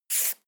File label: marker line medium